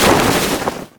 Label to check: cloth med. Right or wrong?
Right